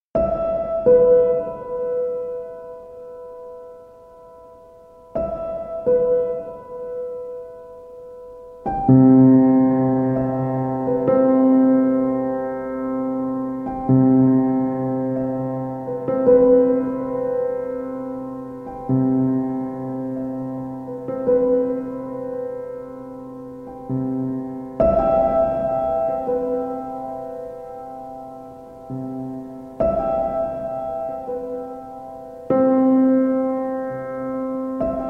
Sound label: Piano Sounds